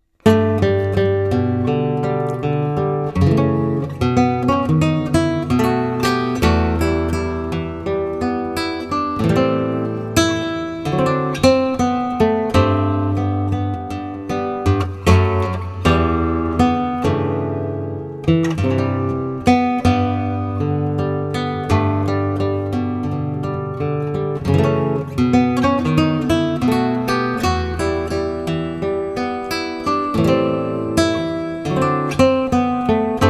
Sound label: Flamenco chill